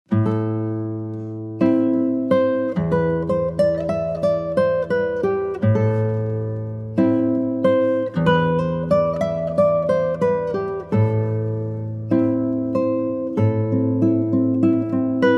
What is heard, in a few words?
original work for classical guitar; walking under the moonlight